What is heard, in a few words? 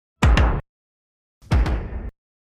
ident